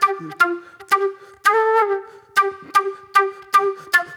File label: Flute, Disco